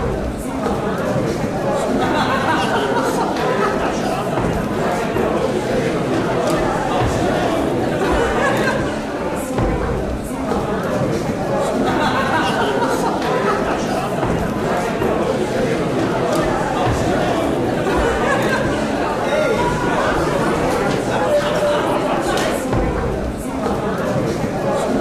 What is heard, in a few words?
People; life of a naomi; Naomi Carrigan; bgs